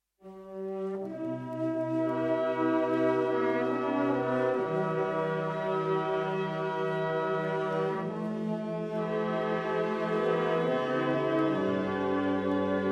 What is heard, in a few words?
Hymn, band; Jubilee